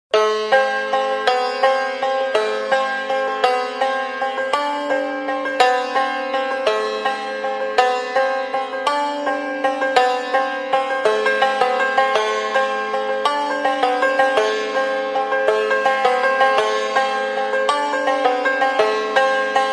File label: chahargah